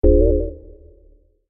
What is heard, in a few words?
biometric id fail; LG K92 5G